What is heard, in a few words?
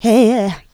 Vocals, Adlibs; Houseworx